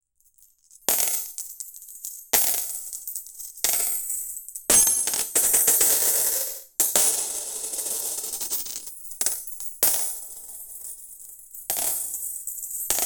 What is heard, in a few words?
explosion of billions of, coins